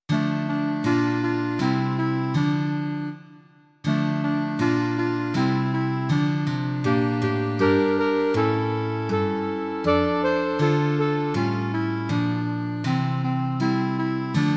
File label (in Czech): klarinetové trio a kytara; Plakal strachem ve studni; Imaginativní verze